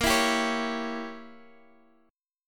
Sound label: Bb Diminished 7th Bb down Guitar Half Step Down